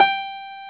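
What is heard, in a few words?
PIANO CLEAR G4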